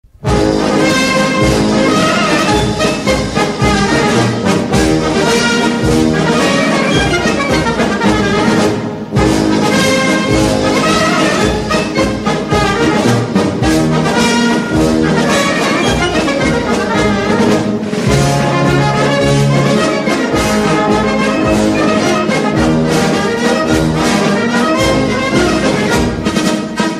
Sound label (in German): Bayrischer Defiliermarsch, klingelton